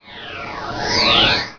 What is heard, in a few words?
whoosh